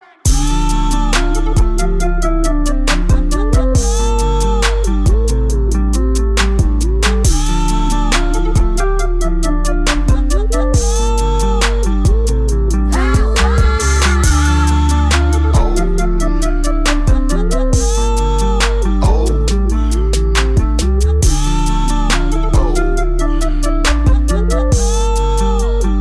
Beautiful Hip Hop

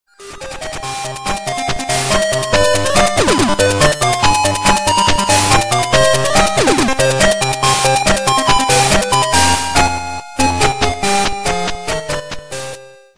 8 bit

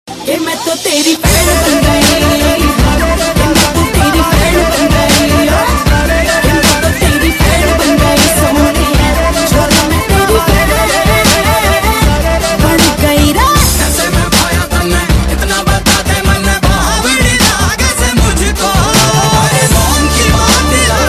Metal

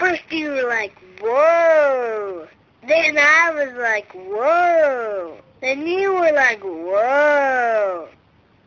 wooo

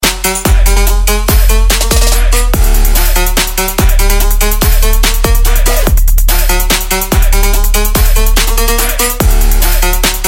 BINGBONG

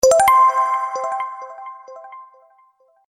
Happy Pling

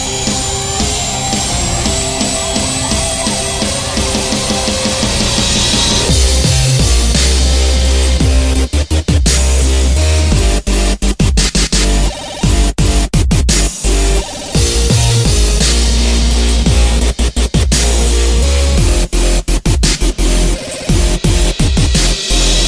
GAME PLAY DUB

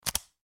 Car lock Iphone

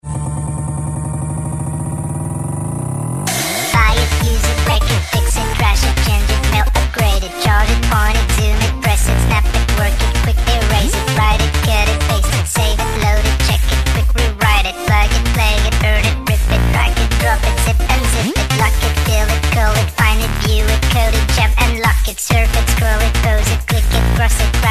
insane ringtone

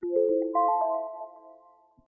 alarm; windows10

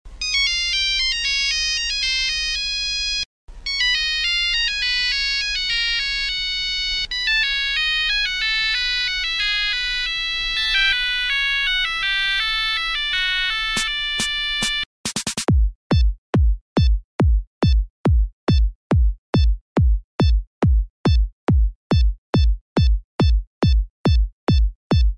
nokia sms tune; ringtones

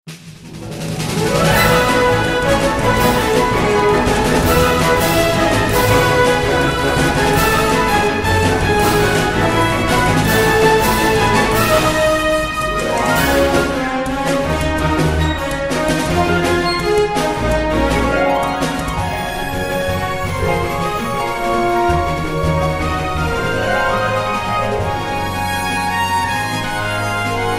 Orchestra

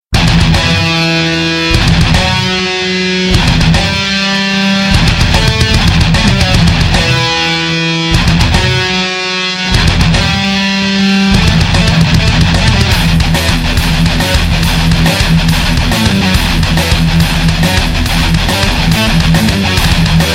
halo theme metalized; rock

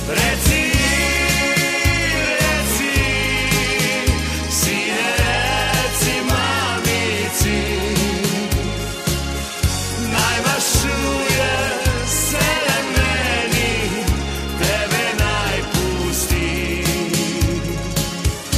sine

